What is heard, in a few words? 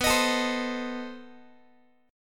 B 7th Flat 9th, down, Guitar; B7b9 strummed; x,19,18,19,16,x; JGuitar